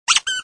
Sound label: squeak